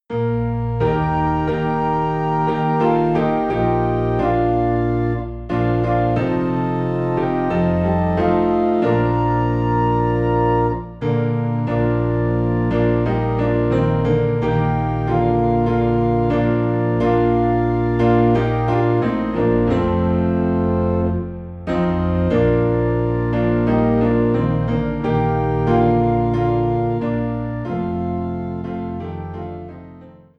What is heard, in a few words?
Piano and Organ Low in B Flat; I Know Whom I Have Believed